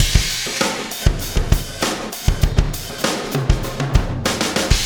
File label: Wormhole Beat, Processed Drumkits